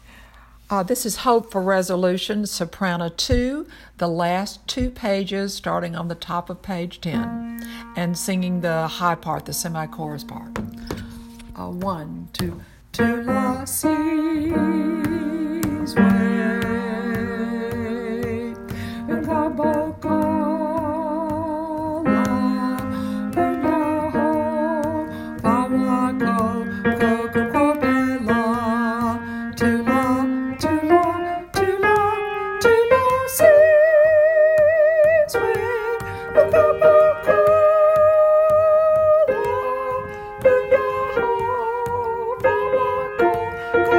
Hope For Resolution last 2 pages Sop 2A 2B, childrens choir